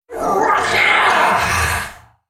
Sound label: Droid spellcast effort